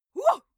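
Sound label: female attack wuh, screams; schreie; menschen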